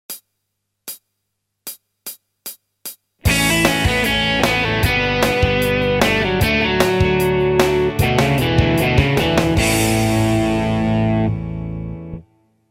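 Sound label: Classic Rock Solo, guitar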